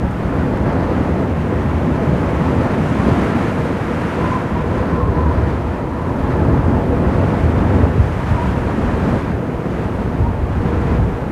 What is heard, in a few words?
wind loop desert; Call of Duty Modern Warfare